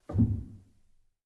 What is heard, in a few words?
rel, Grand Piano; bitKlavier Grand; Piano Bar